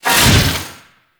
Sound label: Melee Whoosh; Warframe; Sentients; Erra